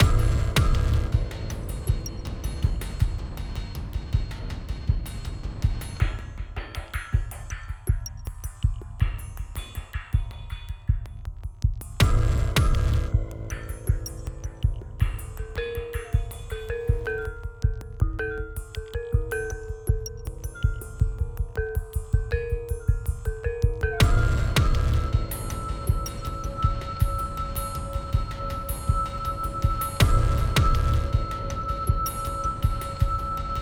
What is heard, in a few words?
Music Neutral; Sifu; H4 Level Area=Office Escape